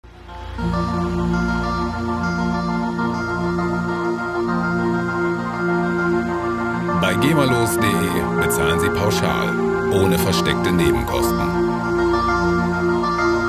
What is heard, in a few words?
ocean